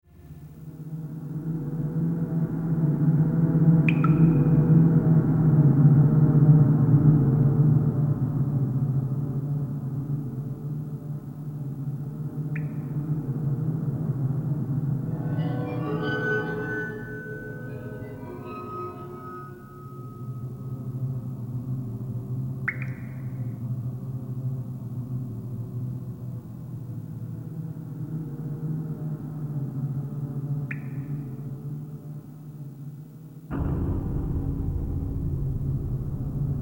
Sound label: Cloud Runner Fortress Prison Ambience; starfox